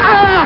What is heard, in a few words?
aargh; Genma Kawajiri